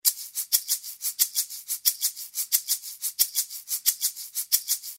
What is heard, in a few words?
shaker; lateinamerika